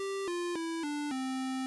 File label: 8 bit lost